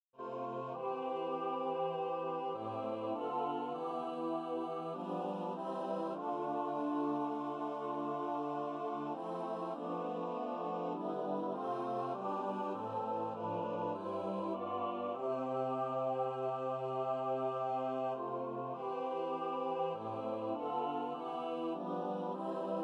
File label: choir